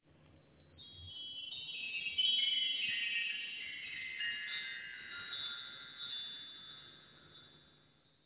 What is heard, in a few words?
Jingle